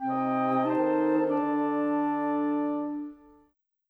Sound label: Winds, Orchestral; Sonic Foundry (Sony Creative Software) - Orchestral VOL-2; Modern 36